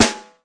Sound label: nice acoustic sd, snare; single hits